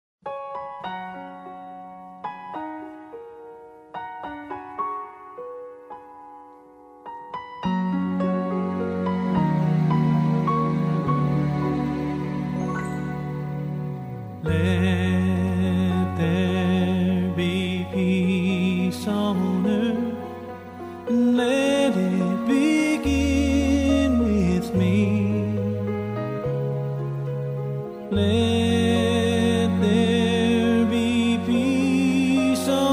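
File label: CHRISTMAS TRADITIONAL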